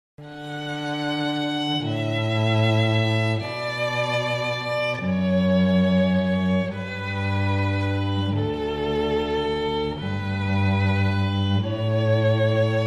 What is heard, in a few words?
Strings